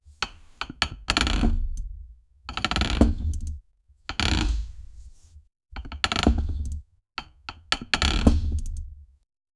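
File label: interior wooden hut ambience, coin clink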